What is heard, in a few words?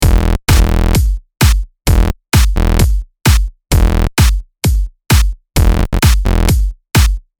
ELECTRO MIX